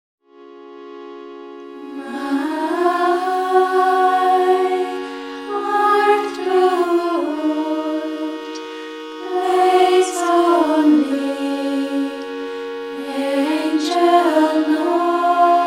flute

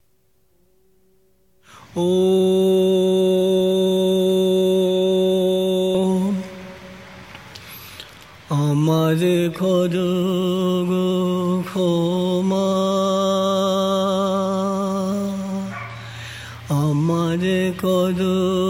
Classical Singing

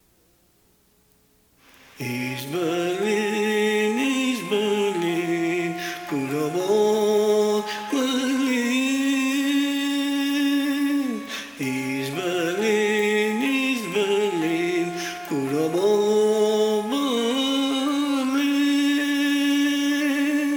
Singing; with Tote a Tune

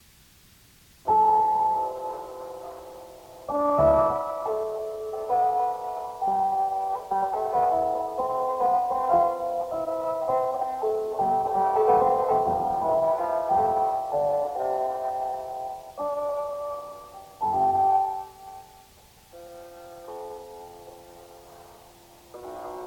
harpsichord spontaneous

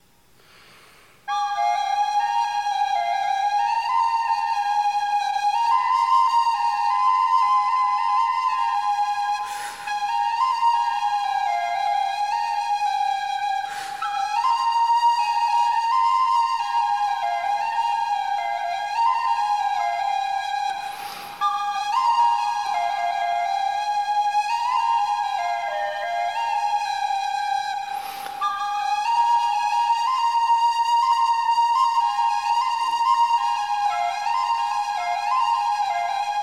Double Flageolet

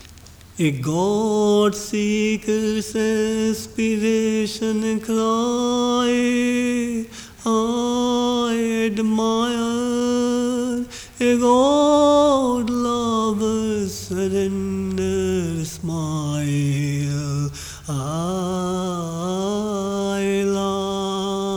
aspiration cry; the birth celebration of sri chinmoys father shashi kumar ghosh

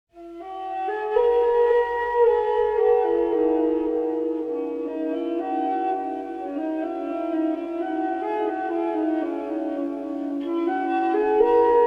Flute Music

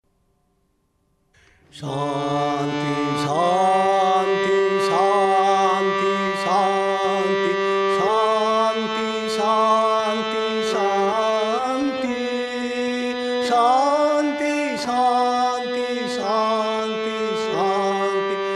Shanti chant; sri chinmoy

